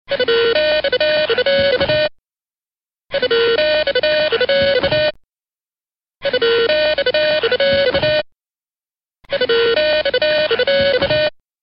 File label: Ring Tone